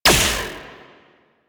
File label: Laser Skin Printer Laser Start; Props; Warframe; Entrati